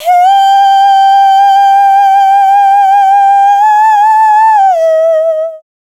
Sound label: Dance Vocals, FEMALE